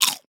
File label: eat chips, potato; minecraft